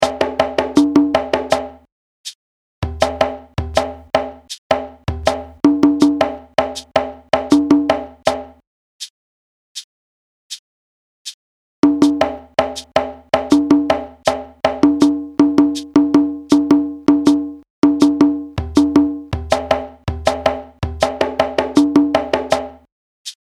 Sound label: Kassa slow hh